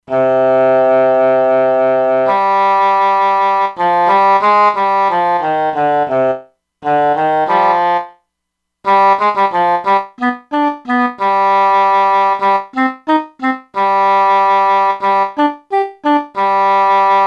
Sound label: English Horn, E-mu Proteus-2; Factory Presets